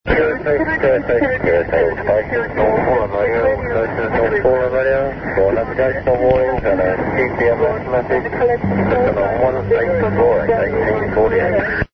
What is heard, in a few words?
north foreland radio; europe